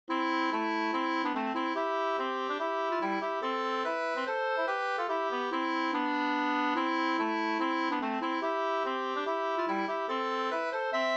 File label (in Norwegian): reinlender